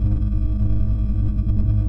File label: Scifi Dome Shield Loop; SFX Kit; Sci-Fi Loops